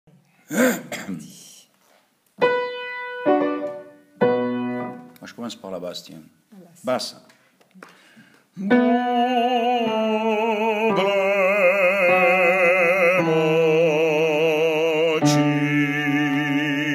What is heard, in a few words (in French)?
basses